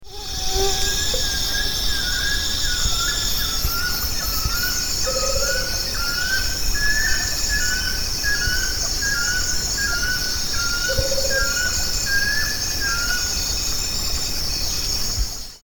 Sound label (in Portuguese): Amaurolimnas concolor Saracura lisa